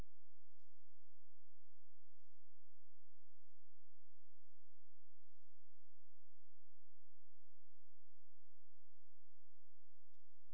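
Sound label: hydrophone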